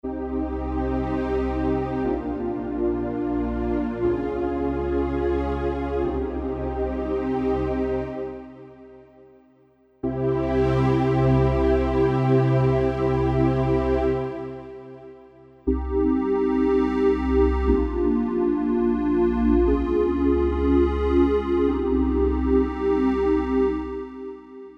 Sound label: pad